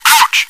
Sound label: die